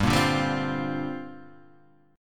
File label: A sharp 6th Add 9th